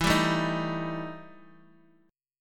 E Minor Major 7th Double Flat 5th E Guitar; strummed; JGuitar

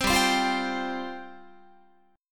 G 7th Suspended 4th G down Guitar

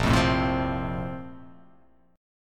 Bb Major 7th Suspended 2nd Bb down; BbM7sus2 strummed; JGuitar

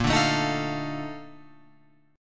Bb Major 11th Bb Guitar Open F; strummed; JGuitar

